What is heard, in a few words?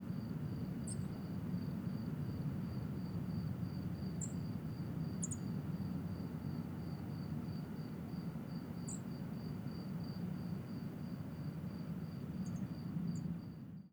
strong modulations